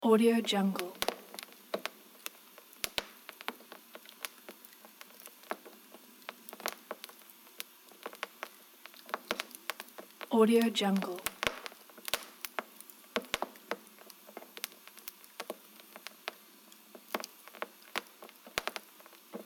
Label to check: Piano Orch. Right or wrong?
Wrong